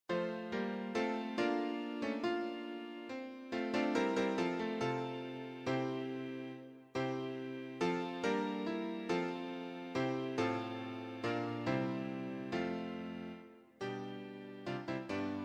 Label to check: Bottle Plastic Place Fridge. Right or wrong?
Wrong